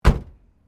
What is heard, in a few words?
CAR MAZDA RX7 SERIES3 EXTERIOR DOOR CLOSE; Car Mazda Rx7 1983 Series3; Cars (Sound Effects:Vehicles)